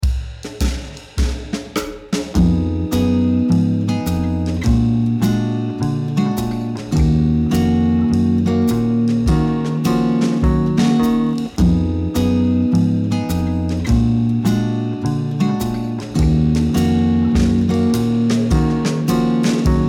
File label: two five one E flat minor